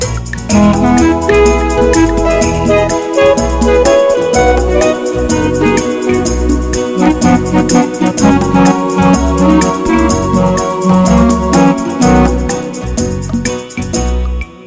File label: A Pretty Swing